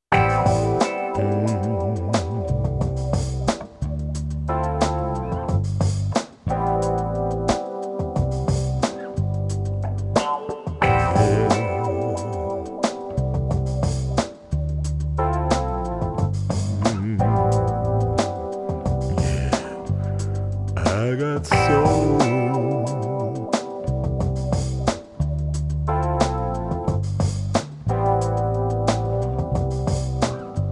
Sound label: Acid Jazz; Kid Pix 3 Deluxe; Windows